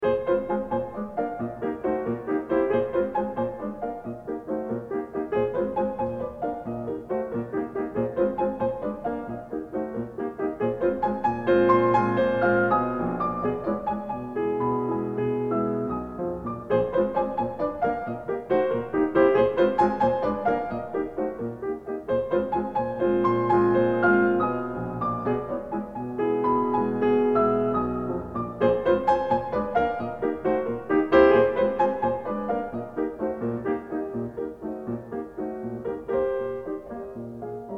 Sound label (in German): Carnaval für Klavier; Wilhelm Kempff; Op 9